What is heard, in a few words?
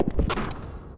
Deconstruction Set Dropline Fast